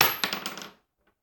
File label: Bullet Shell; Sound Ideas; Guns